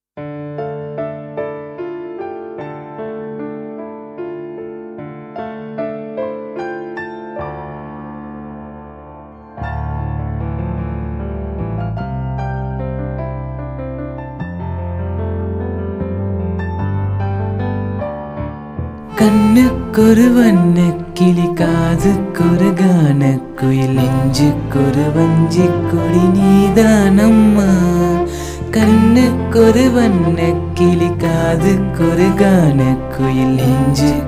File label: Cover Unplugged